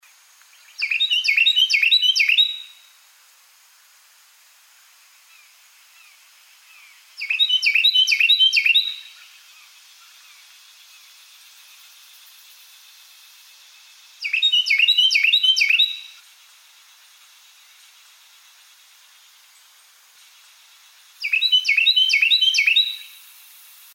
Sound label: carolina wren s song